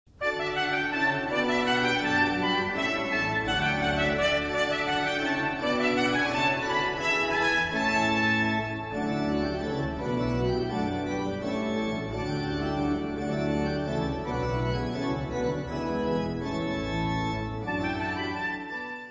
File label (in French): Trompette